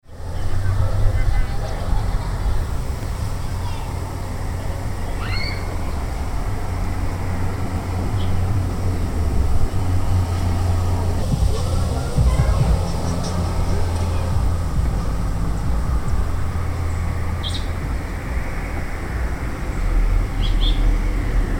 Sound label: Dutchtown South Ambient